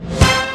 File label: XD-5, DRUMS PERC, BOSS; Rubber Chicken Software Sample Bank